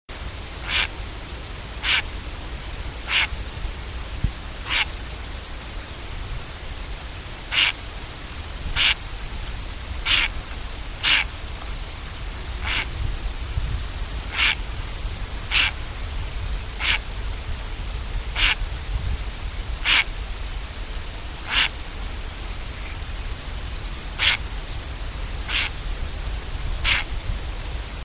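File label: ryzhaya caplya golos, purple heron voice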